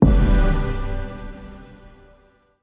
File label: big win music end; godsofgold